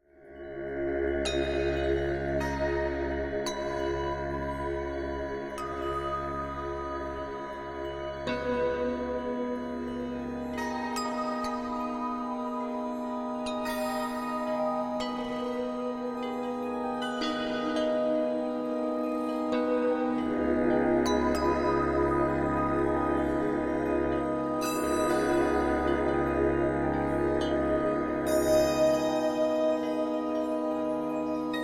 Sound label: Male Choir